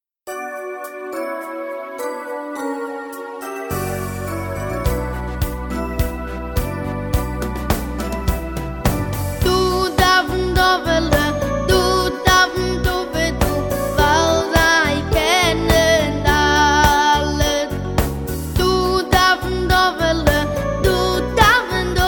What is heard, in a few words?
niggun